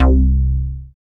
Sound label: BASS MONO